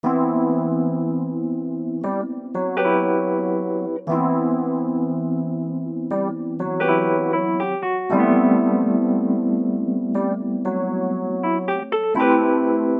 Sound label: piano; loops